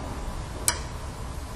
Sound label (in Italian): possibile interazione cinetica su microfono